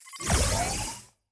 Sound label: Door, Sci-Fi Sounds